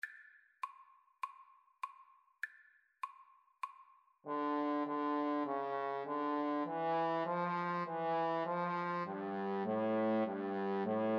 bach aria trombone duet

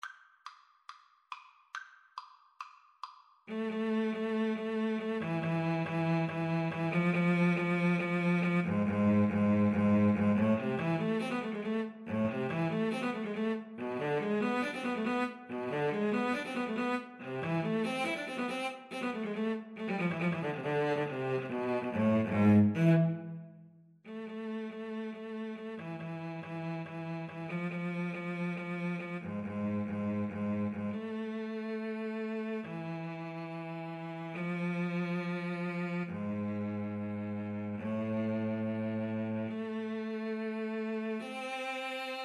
Cello Duet

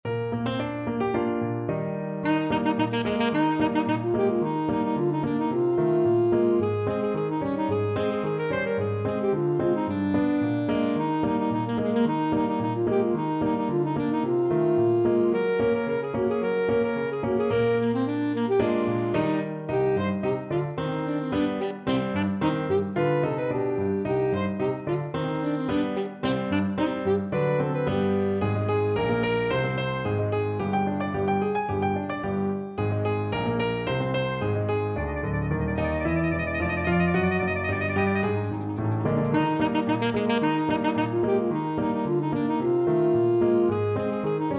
ASAX, saxophone

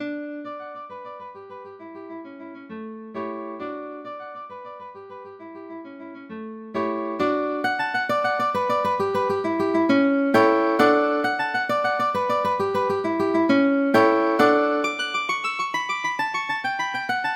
etudes, guitar; giuliani; 24 etudes

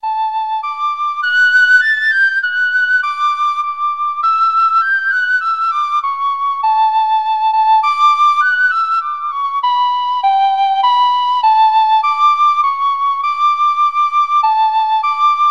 Ash Grove penny whistle

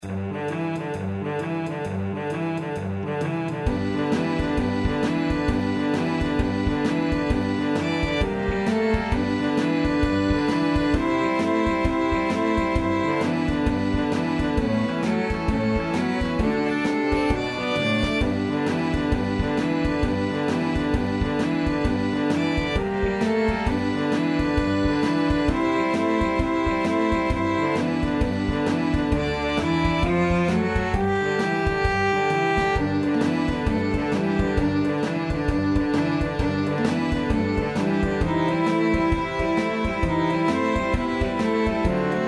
boogie brass, 2 players and rhythm section; 3 players and rhythm section

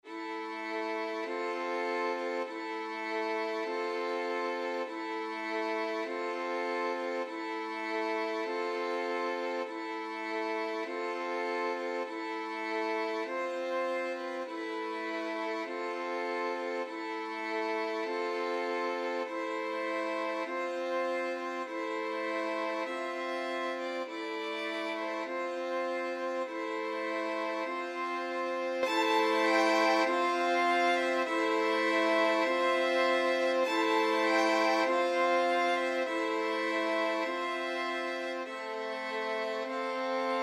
funeral march, violin quartet; chopin

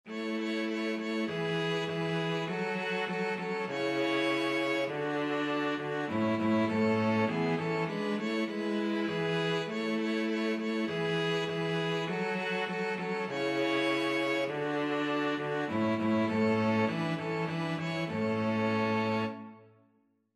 string trio

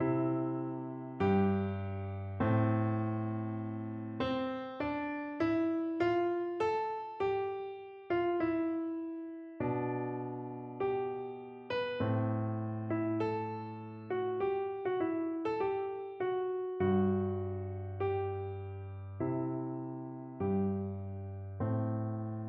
March of the Priests, piano

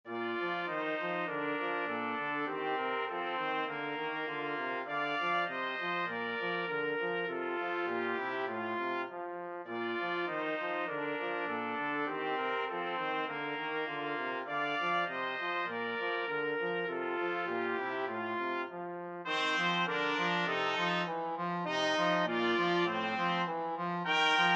melody trumpet trombone

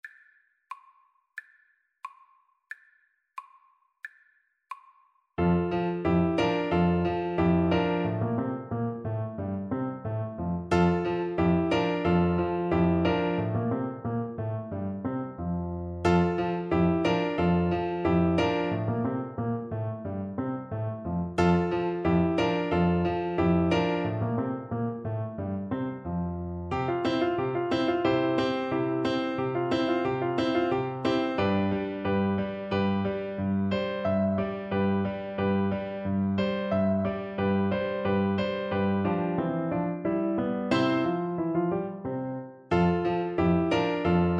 musette, Bassoon

